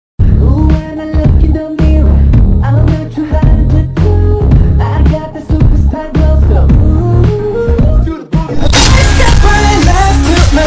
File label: slowed reverb korean ringtone